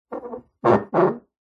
household cup mug turn rotate scrape on wood table